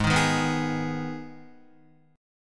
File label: Ab Minor Ab down Guitar; Abm strummed; JGuitar